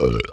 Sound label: burp short gulp